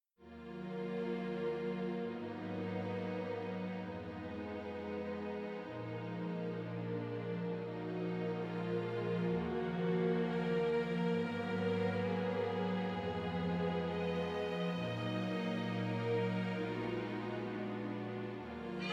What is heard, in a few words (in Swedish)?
Hornlåt G moll